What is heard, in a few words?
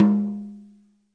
real TOM, REAL LIVE KITS; A Real Freakin Drum Kit